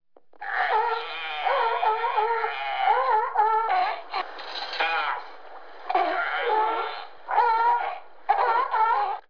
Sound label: sea lion sounds